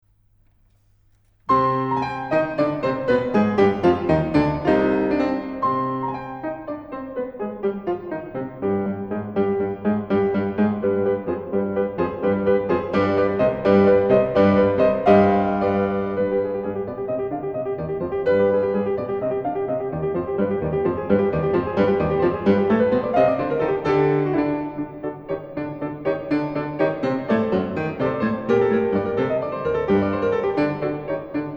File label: Sonata in C Minor Dorian Mode; Rubio 48